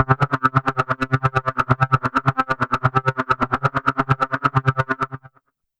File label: Trance, YAMAHA RM1X